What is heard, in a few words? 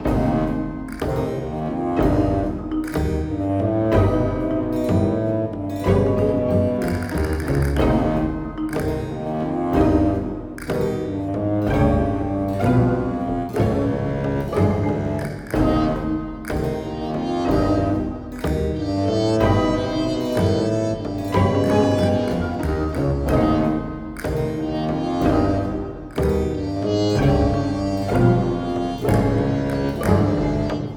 Cinematic Music; Too Many Tea Cakes